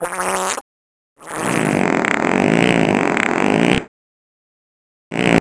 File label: Fart